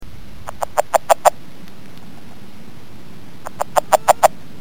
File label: rana aurora, frog toad calls; amphibians